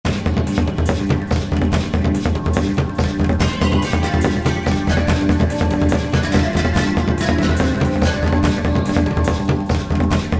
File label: Kenya Split, Roland SR-JV80-05 World